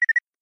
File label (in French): bruit reussite